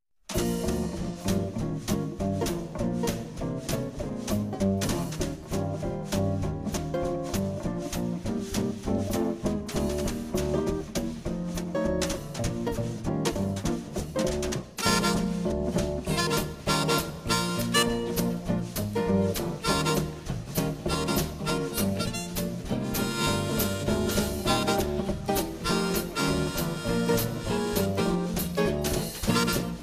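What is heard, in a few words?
Oldtime Jazzband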